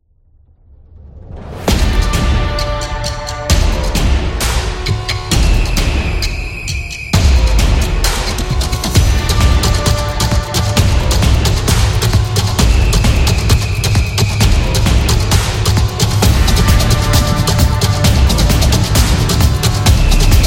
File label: Sports Vlog, sport drums